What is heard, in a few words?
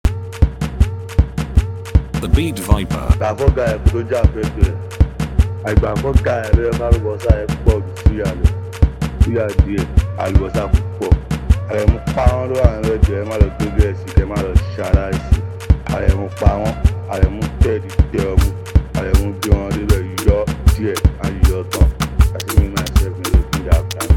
AREMU PAWON BEAT